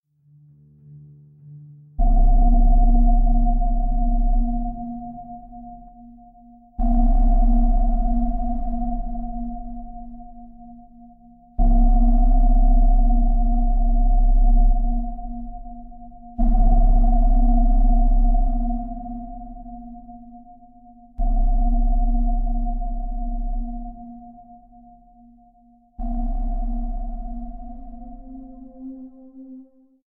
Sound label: tense